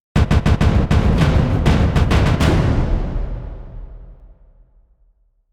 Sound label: Mechanical Taiko Slam; Gothic Storm Music; Brain Warp (Sound Design Trailer Tools)